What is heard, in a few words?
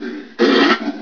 alert